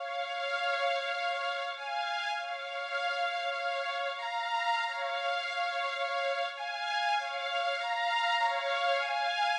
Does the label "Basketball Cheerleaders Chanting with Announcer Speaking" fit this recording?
No